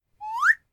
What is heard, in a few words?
Comedic Whistle B